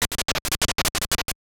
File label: Fuse; p.o.w. prisoners of war 1989 nes